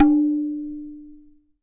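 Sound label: TABLA left; WorldSounds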